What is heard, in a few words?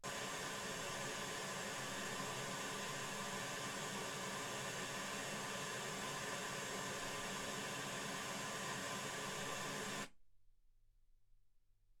wgn 48kHz